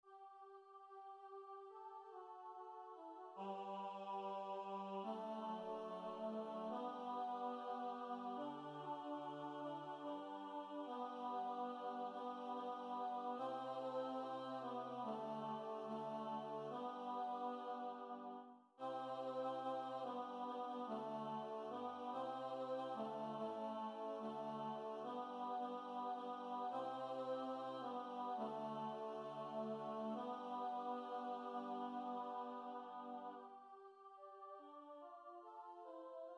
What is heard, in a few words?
Mass Sanctus tenor